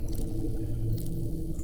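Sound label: Music Dpad Evaporate 145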